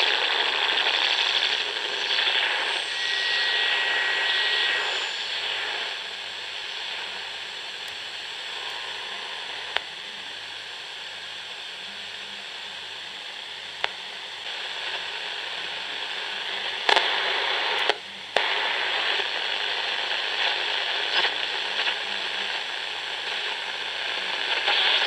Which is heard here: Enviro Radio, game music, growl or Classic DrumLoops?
Enviro Radio